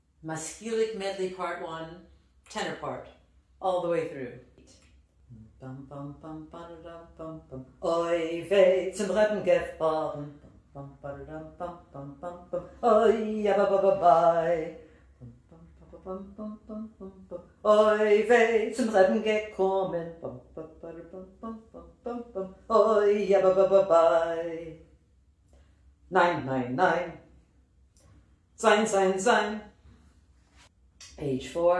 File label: tenor; maskilim medley